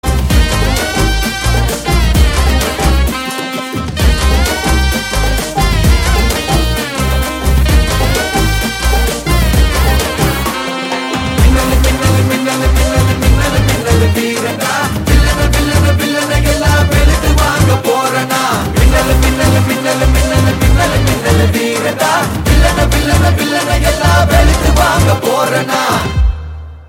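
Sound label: background music Ringtone; Veeran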